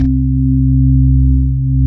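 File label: choir organ C2; PAROISSE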